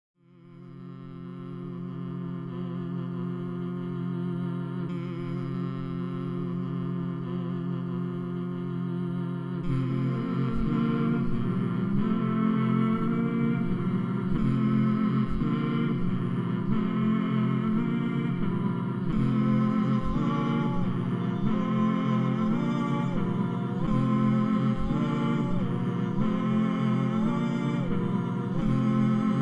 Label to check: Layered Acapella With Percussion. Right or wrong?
Right